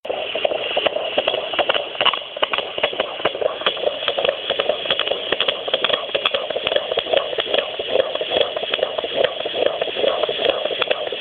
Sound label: Heart Sounds 25 Week